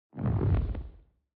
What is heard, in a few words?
ripple